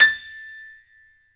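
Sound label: piano, Grand piano; Club-50 - Foundations Roland; Grand Pno 1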